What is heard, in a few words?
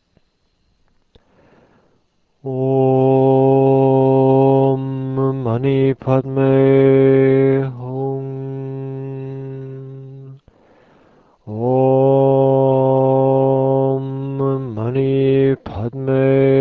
Om Mani Padme Hum, mantra